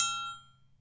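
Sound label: TRIANGLE closed, Synclavier Percussion; ILIO; Synclavier Percussion 2; World & Orchestral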